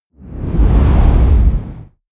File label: whoosh deep squall heavy short